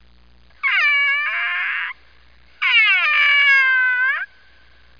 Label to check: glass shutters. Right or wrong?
Wrong